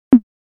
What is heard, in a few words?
Tom, e-drums